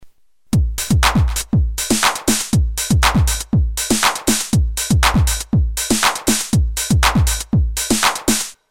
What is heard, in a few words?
Drums clean